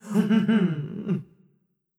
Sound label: Laugh; Legend of Keepers (gamerip) (2019); Dryad Reaction Laugh